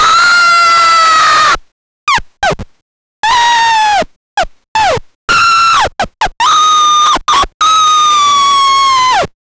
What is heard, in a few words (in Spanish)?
gritos cortos de dolor de, 16-bit